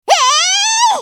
攻撃長; ルディン

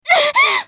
被ダメージ; カリュケ

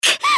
被ダメージ; グレイス

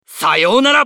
男･RIP; マスケッティア

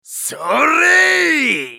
攻撃長; ナジブ

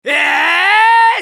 攻撃長; ソホ(格闘型)

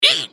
攻撃短; ジャック

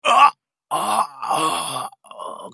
男･ダウン; ウォーロック

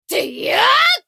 攻撃長; 迅雷のアドリアナ

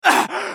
被ダメージ; ソホ

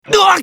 ダウン; ガルシア

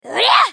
ファイター女･攻撃

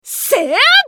攻撃; カリュケ

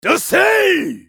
攻撃 長; ナル

